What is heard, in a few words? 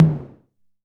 KR55 CONGA L; INIS - Funky Xtreams; KR55 KIT